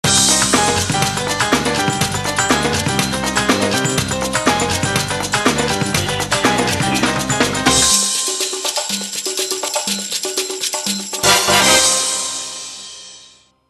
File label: latin loops; V710 Ringer